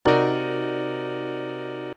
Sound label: Cmin7b5